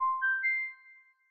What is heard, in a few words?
Charging Complete, ui; Fujitsu F-02J